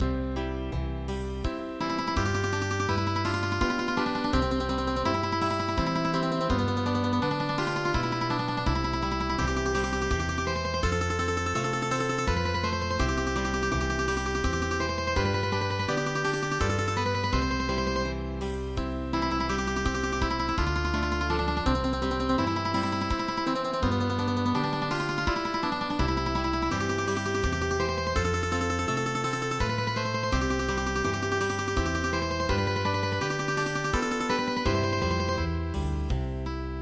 Swiss Mandolin Club; midi; traditional

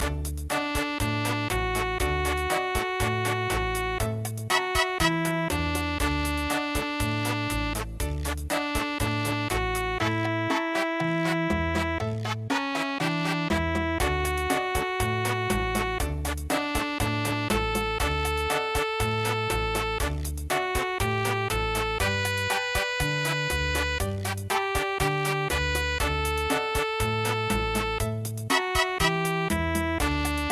chachacha; Banks Of The Ohio

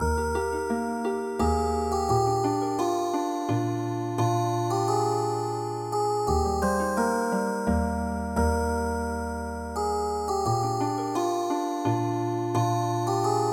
JUANITA Healing Harp

